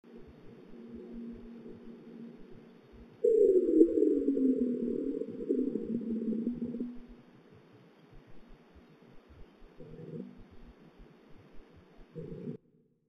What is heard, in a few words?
Signal